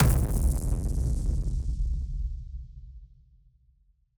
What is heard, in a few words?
Hit Splosion; musicradar; bomb factory